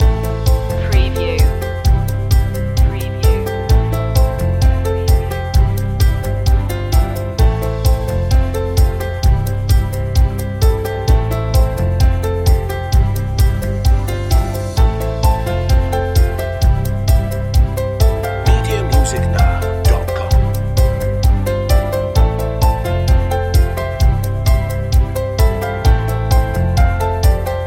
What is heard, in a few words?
cheerful inspiration loop